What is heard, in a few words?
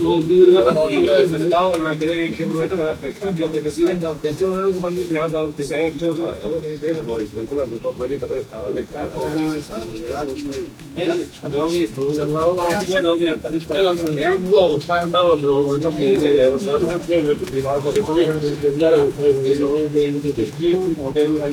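old russian village people, activity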